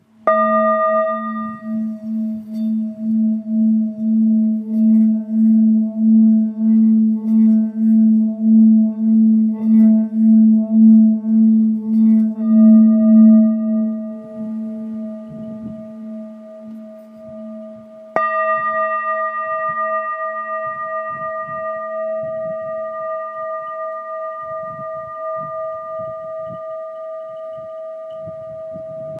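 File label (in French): Full Moon La, bols chantants tibétains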